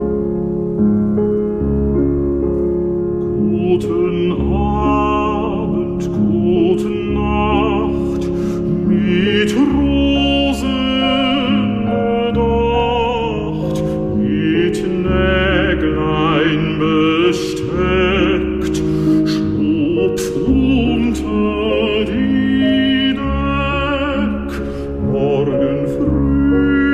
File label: piano, Cradle Song; Lullaby